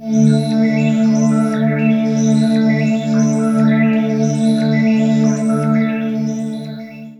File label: Melody, Akai Pads; Mule